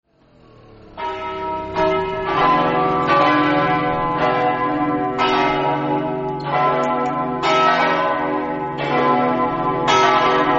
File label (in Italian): campane; sedegliano